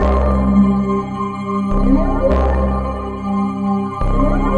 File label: pad aqua; Sonic Foundry (Sony Creative Software); Ugly Remnants VOL-2